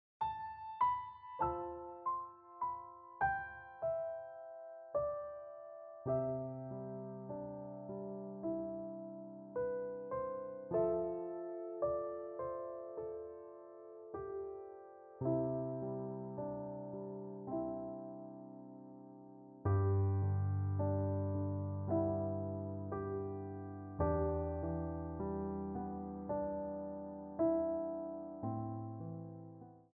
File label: Piano Music